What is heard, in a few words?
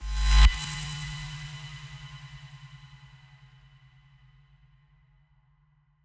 Coil Gm